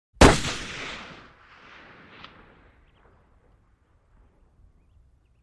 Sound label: 30 06 Hunting Rifle